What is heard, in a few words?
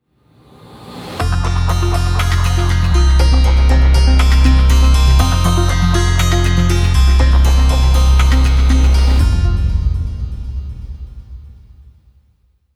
Beautiful Short Logo